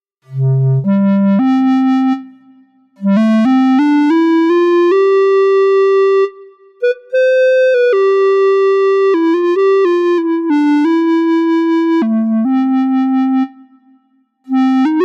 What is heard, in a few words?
Clarinet